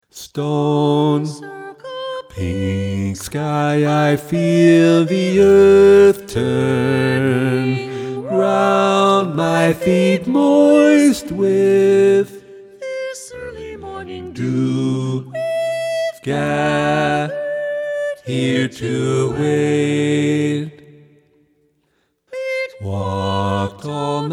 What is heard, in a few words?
Tenor focus, choir; Summer Solstice 2